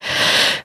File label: Siren Prepare Scream; zombie plague